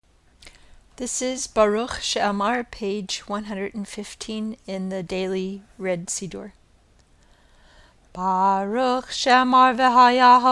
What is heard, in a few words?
Barukh Sheaamar